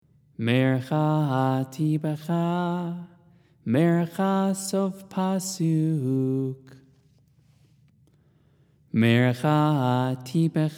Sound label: sof pasuk haftarah, trope